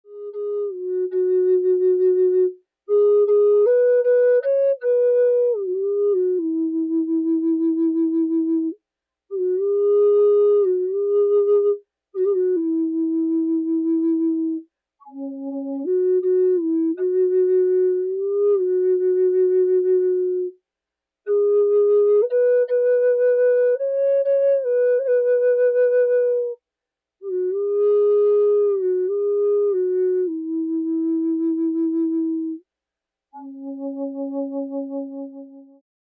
Bass C sharp Bloodwood, flutes

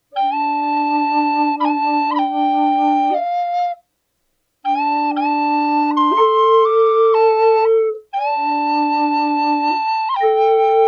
Duet Bass D Small D, flutes

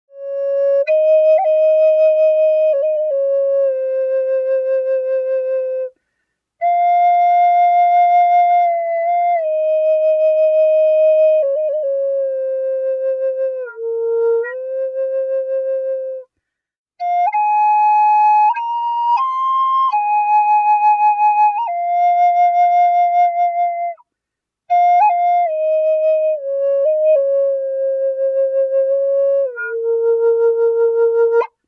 Medium Bb Western Cedar, Flutes

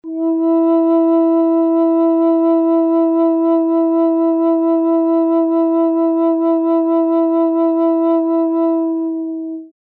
Large E, Flutes Single Note

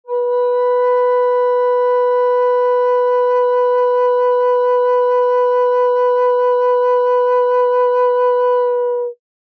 Flutes Single Note, Medium B

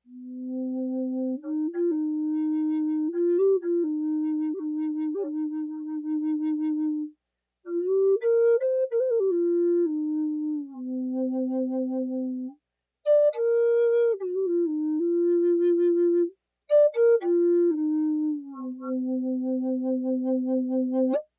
Curly Maple Bass C, flutes

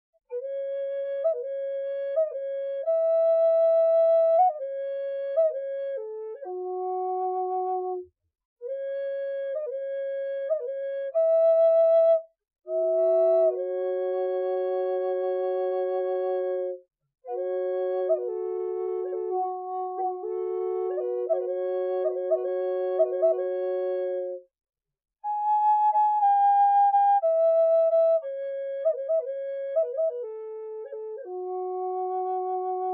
Drone Low F Sharp, Native American Drone Flutes; Scales; Full Spectrum Scale

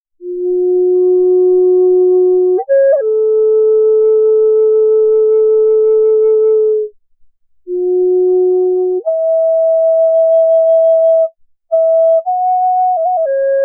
Large F sharp Eastern Cedar, flutes

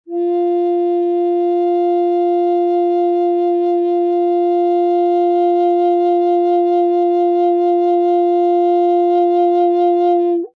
Large F, Flutes Single Note

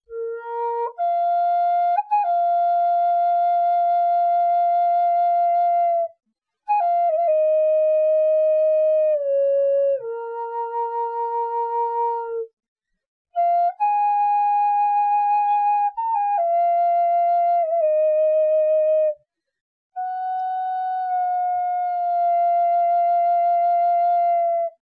Medium Bb Cherry, flutes

